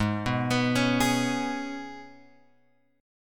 G sharp Minor Double Flat 5th G sharp; {4 2 X 4 2 4} arpeggiated

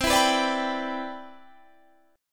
E Minor 7th C; Em7/C

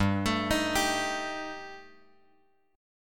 G Suspended 2nd Sharp 5th G; Gsus2#5 Chord {3 X X 2 4 3} arpeggiated

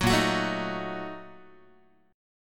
A sharp Major 7th Suspended 4th Sharp 5th, Guitar; strummed

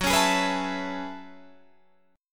Gb Minor 11th Gb Guitar Open D

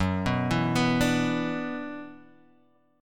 F sharp 7th Suspended 4th Sharp 5th; x,x,4,4,4,6,4,x

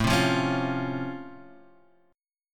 C sharp Augmented Major 7th A, chord

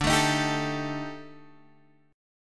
Eb Major 7th Flat 5th D, guitar

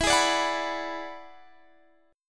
E 9th E down Guitar Open D

